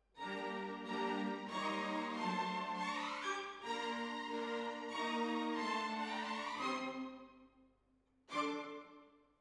Hall, Jazz for piano

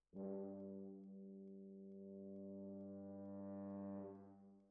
Trombones, jazz for piano

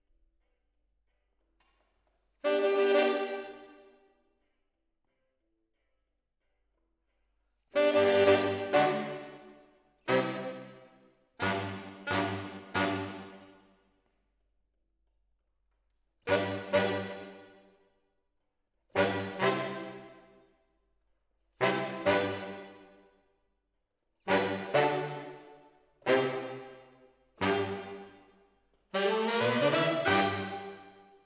MKH AB, piano

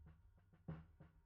Sub

